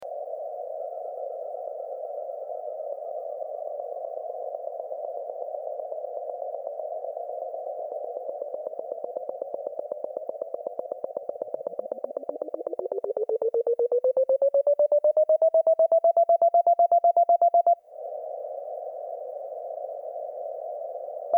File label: K2 No AF